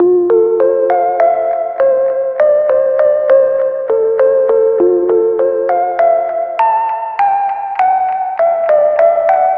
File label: Synth, Trip-Hop Loops; Sonic Foundry (Sony Creative Software) - Rondo Brothers Trip-Hop Loops & Samples; El Corazon